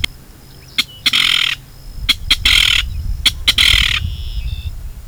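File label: Clapper rail kek burr; National Marsh Bird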